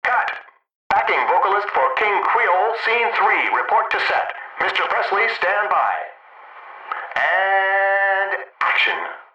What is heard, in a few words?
Backing Vocalists